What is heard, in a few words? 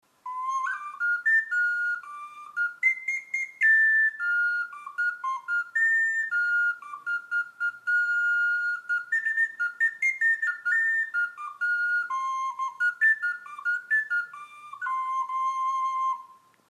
Inka Piccolo